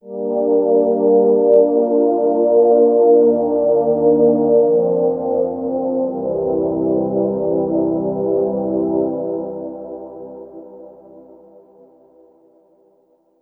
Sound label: Overlay Tone